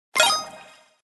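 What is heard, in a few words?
button click; bonanza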